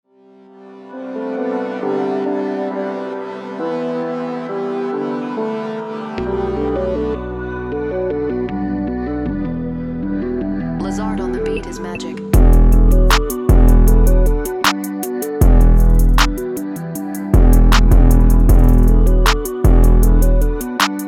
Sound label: digital pop Em 156